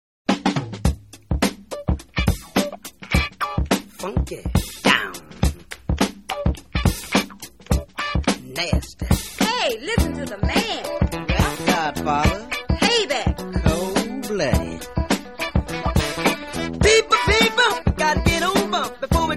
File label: 70s funk